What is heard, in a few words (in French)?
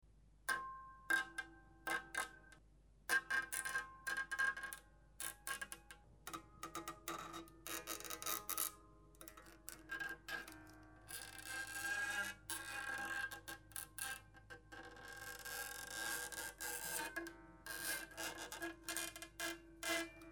grince